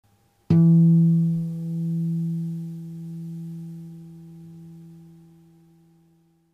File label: 12th fret harmonic